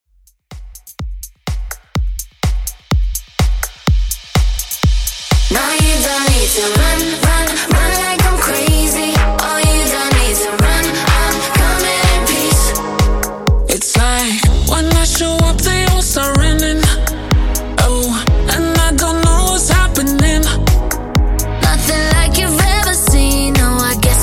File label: Club Workout 125Bpm